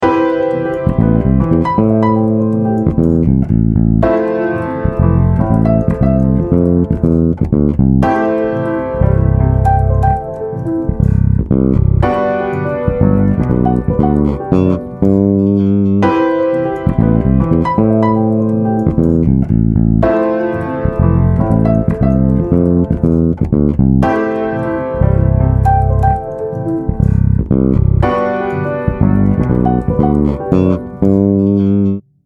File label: Aminor, a I chord for the III; bass; a bassist's guide to chord substitutions